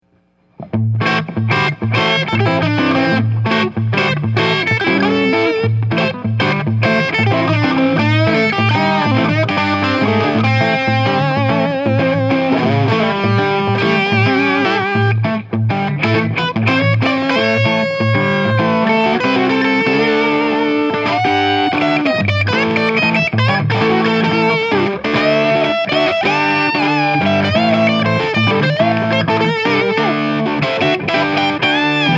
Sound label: 18watt